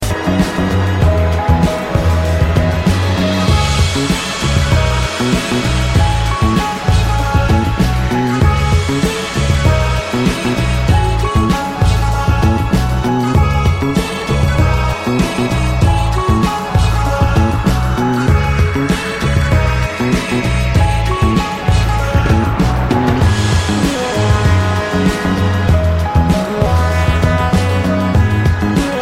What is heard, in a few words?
Cry Baby Great Rnbt